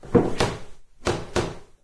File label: wood creaks; random amb sounds